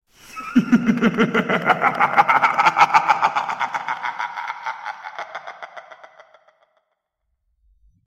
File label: scary laugh